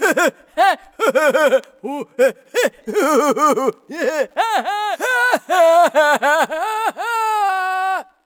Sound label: laugh long goofy; ooh ahh